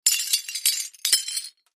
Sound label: Wood Tile Lrg Squares, debris